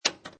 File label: Pinball Sound Mechanical Clank; Video Games